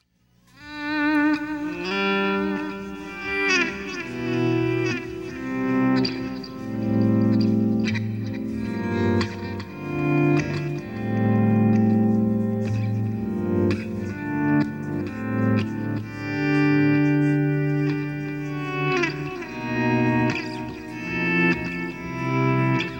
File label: Auto Volume Delay